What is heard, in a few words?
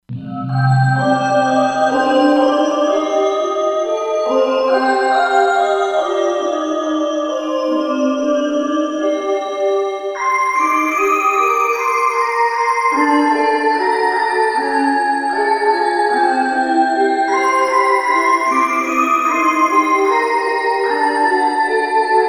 Tiny Voices, Korg MSC-07S Synth; Korg MSC-07S Synth 2